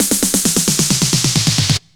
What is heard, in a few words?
FX; AMG Megabass REMIX! VOL-2